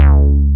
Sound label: BAS Jupiter 8; Best Service Gigapack 2